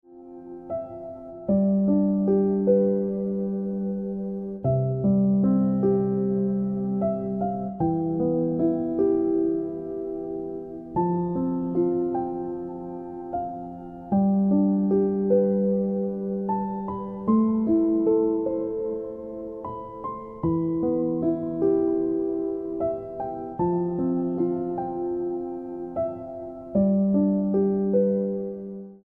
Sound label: Ringtone Piano Music